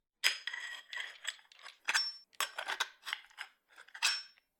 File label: Scrap Stuff; Zomboid; The Workshop